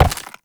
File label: Step Ice; Warframe; Uriel